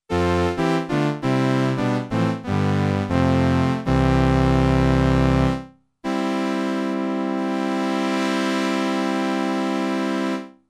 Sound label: STRINGS I w tone adjustments